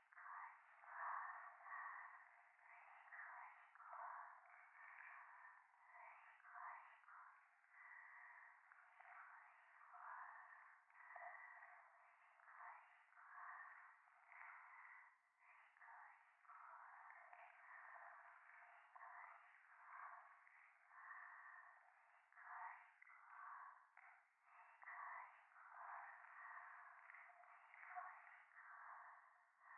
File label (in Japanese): しこしこ 触手; 夢の中